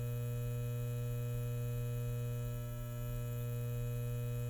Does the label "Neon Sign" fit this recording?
Yes